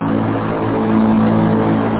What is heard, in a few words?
tube; kunstradio